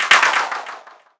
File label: scifi, SCIFI & SWEEPS; Techno Trance; FX & ATMO; Techno Trance Essentials